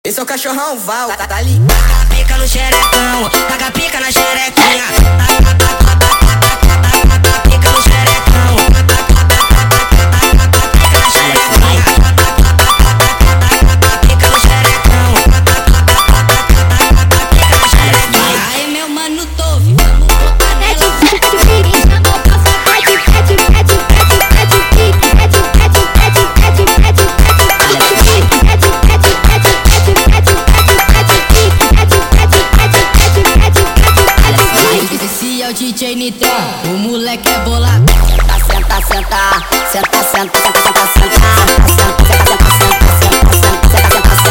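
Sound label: sped Up, phonk music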